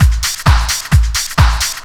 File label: drum 130 groove; Techno Trance Essentials